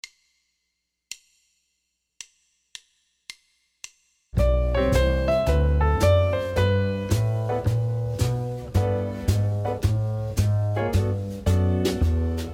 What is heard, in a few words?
short bebop scale